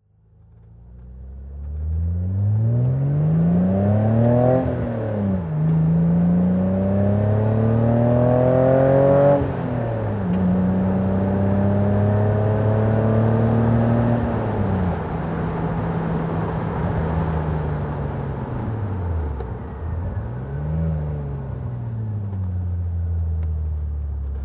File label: BMW Z1 driving, Engine sound driving; 1990; V3D/ ClassicarImages; Marc Vorgers